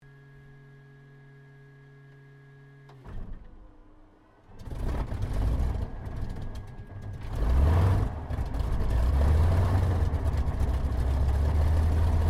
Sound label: AIRCRAFT SUPERMARINE SPITFIRE MK-XVI STARTUP INTERIOR STEREO; Airplanes